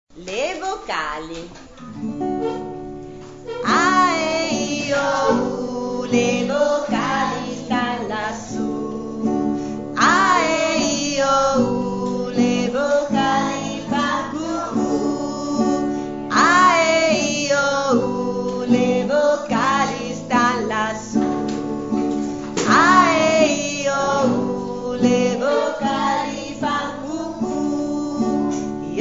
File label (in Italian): Le vocali, canzoncine e filastrocche